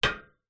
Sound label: impact metal frame tube hollow light